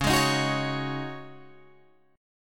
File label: C sharp Suspended 2nd Suspended 4th, C sharp, Guitar, Open G